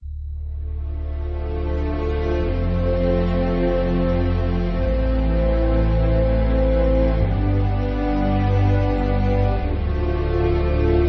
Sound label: end cave song